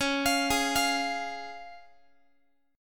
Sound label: C sharp Major, Guitar; arpeggiated; x,21,20,18,21,x